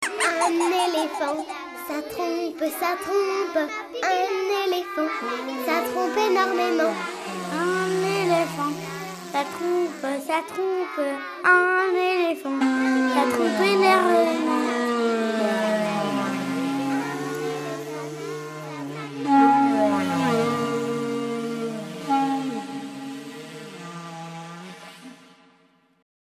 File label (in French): Un elephant ca trompe